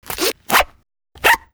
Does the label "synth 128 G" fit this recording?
No